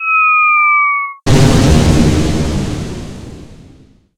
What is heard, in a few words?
Bazooka